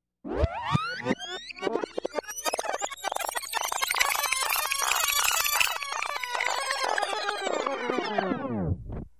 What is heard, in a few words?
Rewinding